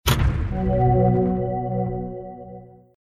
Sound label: Notification Capture The Flag; TankiOnline